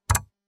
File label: keyboard switch